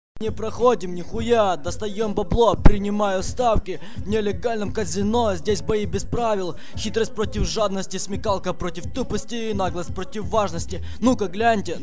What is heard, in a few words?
ice accapella